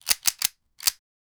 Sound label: bolt action, gun